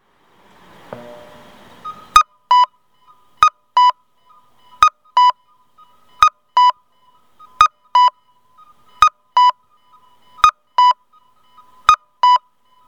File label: kakko, onkyo kosaten; tokushima; miyoshi; nagoya U-TK-1; awagin ikedashiten mae